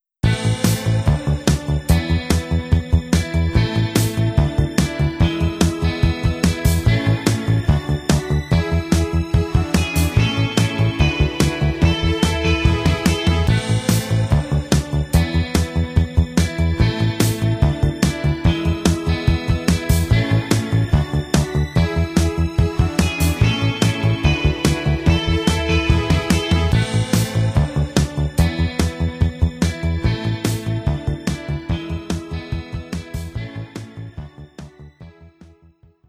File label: Goals Complete; Buildalot